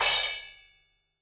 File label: DW Green Maple Cymbal Grab; Sonic Foundry (Sony Creative Software); Vital Drums